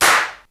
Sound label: claps; xr10